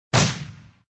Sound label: Shoot; bobtherobber2